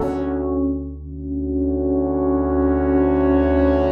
122 BPM Pads Amaj Fmin Tonic D, ambient synth pads; MMB